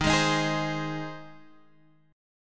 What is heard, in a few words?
C Minor Sharp 5th Eb